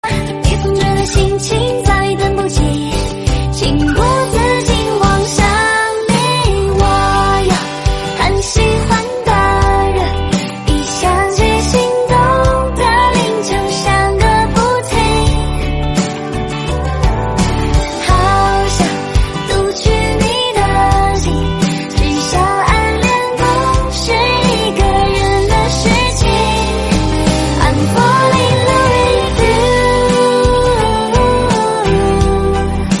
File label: Korean song